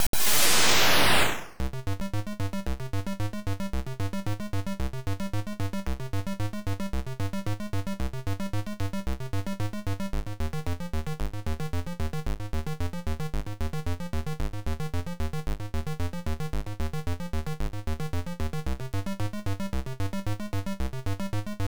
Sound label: Card Stage; Pinball Action; arcade